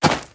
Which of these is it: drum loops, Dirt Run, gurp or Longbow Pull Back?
Dirt Run